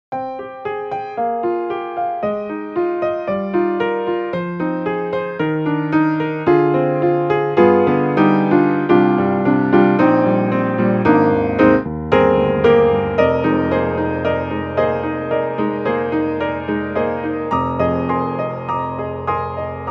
Modern Upright Mono Mix; XLN Audio; Addictive Keys

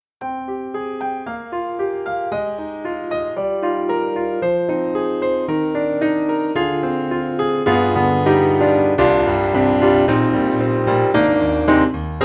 PEARL CONCERT GRAND Old Grand; IMPACT SOUNDWORKS

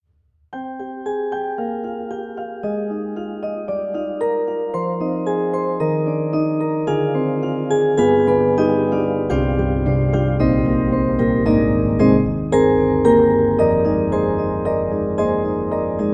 Pianoteq D4 Hybrid, piano; MODARTT S.A.S.

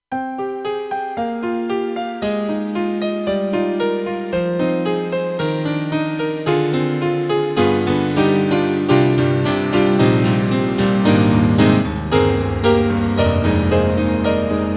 Roland JV-2080 Piano Strings

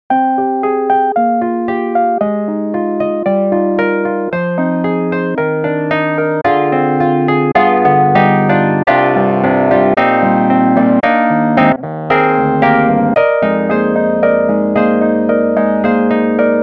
Wurlitzer; RHODES Premier

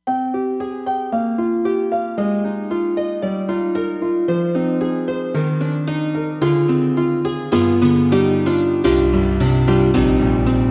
Roland SD-50 Pop Piano

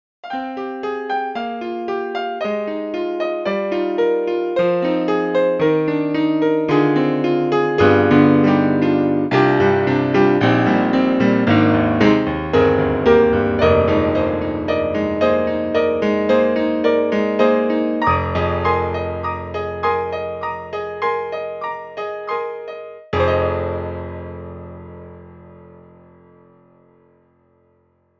Piano; MDA; free VSTi

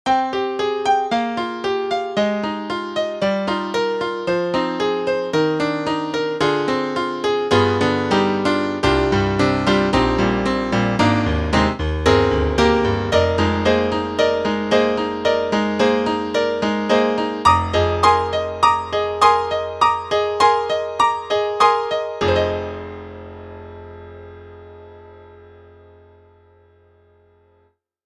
Rock Piano; Steinberg HALion One

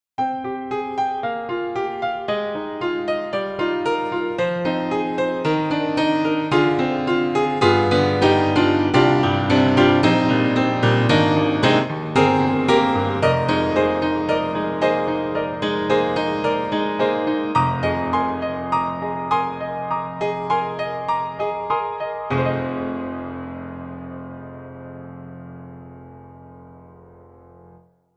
Sheen Grand pedal; IK Multimedia; SampleTank2.5XL

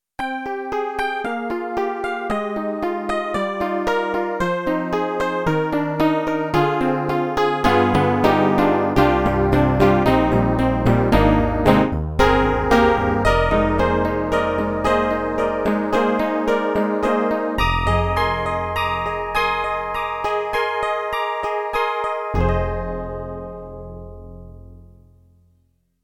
Roland MT-32 Acou Piano

